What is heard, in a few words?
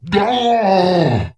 Troll Wound; Trollgore